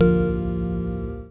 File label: SYNTH Crystal